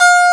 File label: Harpsichord A3